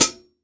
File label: closed hi hat sound g key